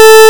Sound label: pulse Oscillator